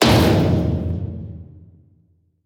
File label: damage hits explosions; World Of Warships